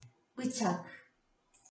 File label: knife